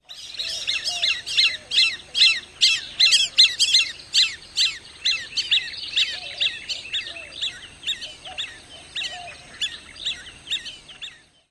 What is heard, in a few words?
p'ew alarm calls